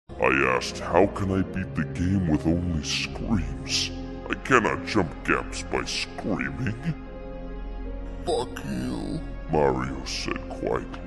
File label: screams